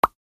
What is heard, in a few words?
Water Drop Notification; Xiaomi Redmi Note 12 4G